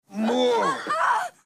mujer grita

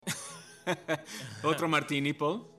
risa; Patrick Bateman otro martini Paul

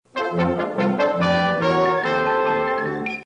orchestra chistosa

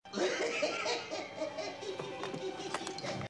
risa guason

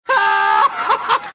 risa

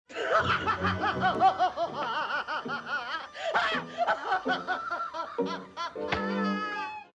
risa

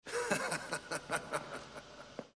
risa malvada

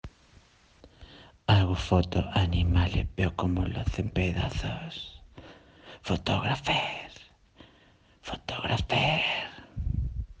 ronqueo

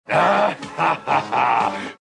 risa

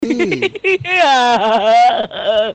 risa

